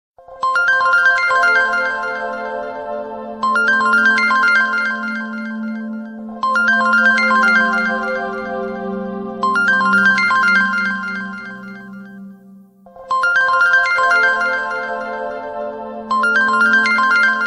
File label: Samsung A33 Ringtone